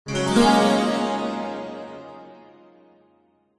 multimedia game sound happy bright warm positive tone